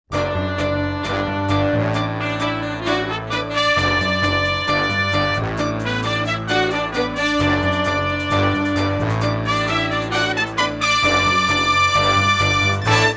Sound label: Quarter Note Accompaniment Only